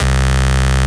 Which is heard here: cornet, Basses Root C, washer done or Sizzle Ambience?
Basses Root C